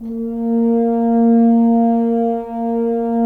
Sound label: Solo F.Horn, Roland LA Composer; Roland - LA Composer vol. 4